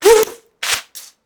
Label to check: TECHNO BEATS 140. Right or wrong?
Wrong